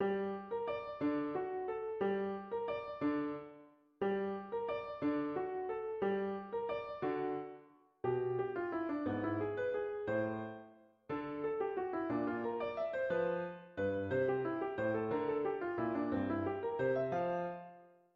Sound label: two-part waltz